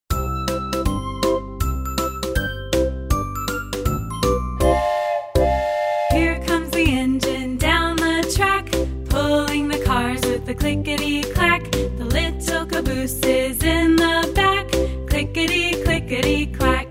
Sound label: Clickety Clack